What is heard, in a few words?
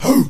"hoo" group of men, voice; ooh ahh; ho hoo woo